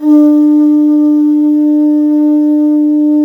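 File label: conch shell; Spectrasonics; Heart of Africa 1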